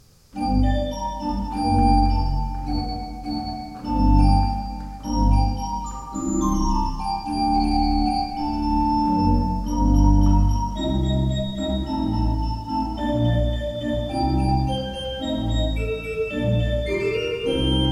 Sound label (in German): Walcker, gebrauchtorgel